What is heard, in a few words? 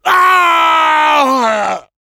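pain crticial death, French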